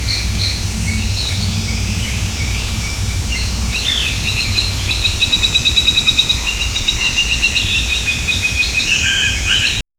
tropical rainforest ambie

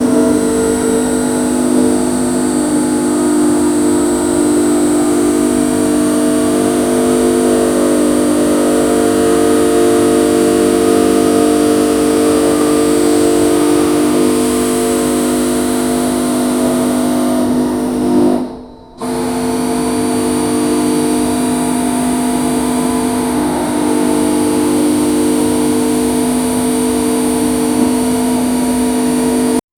injection molding machine

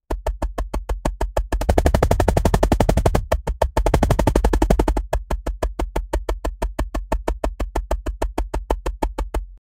wobbling balance drum rol

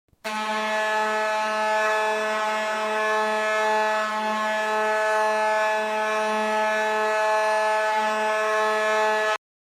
a horn that usually is, hockey

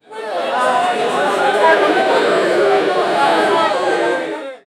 crowd murmuring questionin; questioning

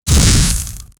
fire slash effect, attack

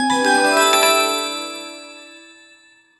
gothic fantasy treasure r

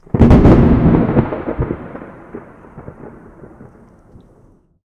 loud thunderclap

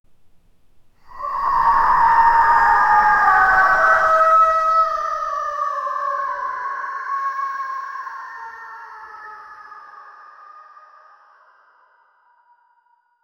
ghost crying horror sound; paranormal

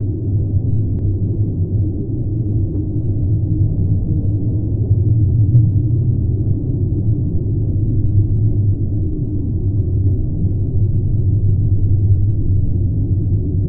inside a space capsule or, vacuum

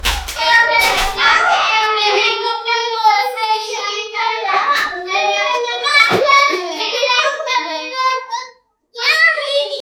the kids go in to, children

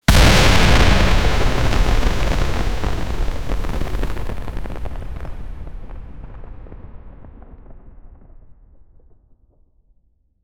a fiery plasma impact sou

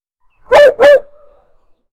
a puppy barking once only

